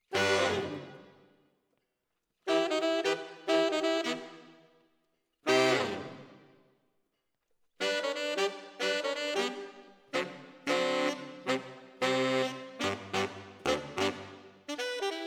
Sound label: ORTF SCHOEPS, piano